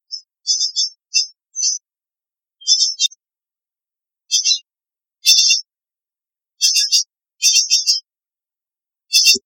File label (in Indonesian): suara panggilan pijantung kecil